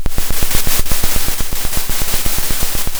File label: Sound of a drum roll